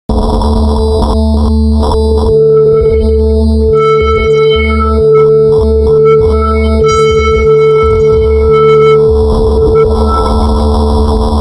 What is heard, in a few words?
Chirpss